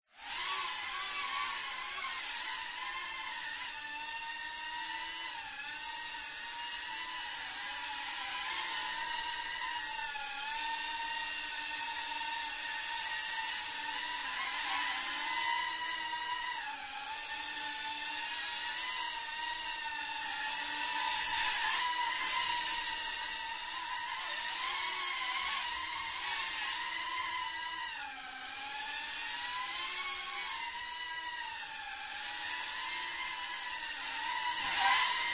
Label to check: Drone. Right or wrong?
Right